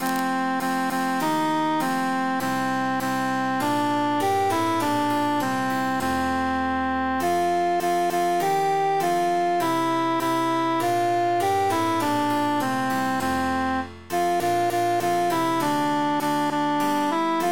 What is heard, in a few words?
altus emphasized; matona mia cara